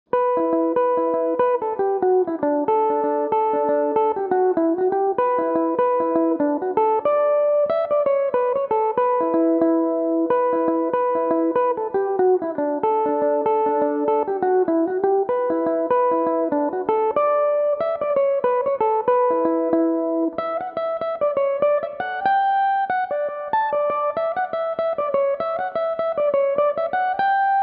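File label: double jig, E dorian, mandolin; The Toper's Double Jig; Belmuse EM-190 electric mandolin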